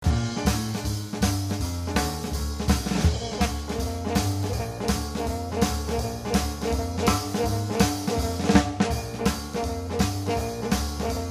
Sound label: music track; Ed Edd n Eddy